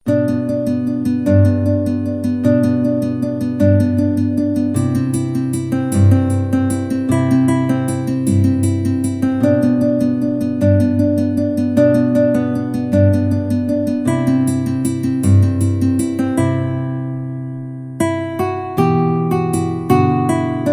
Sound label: Acoustic Guitar Intro